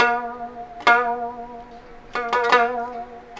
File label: Moon Lute